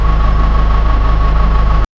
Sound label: diesel bus engine low